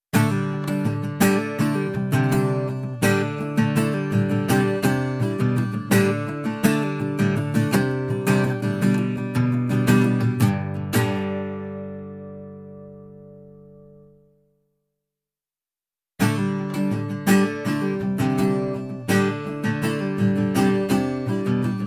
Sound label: Acoustic Guitar